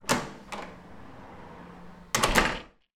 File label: door front open traffic noise close internal